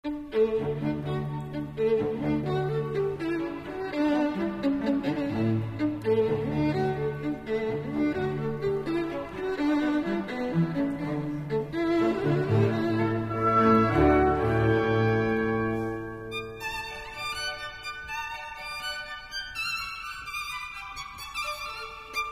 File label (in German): violinkonzert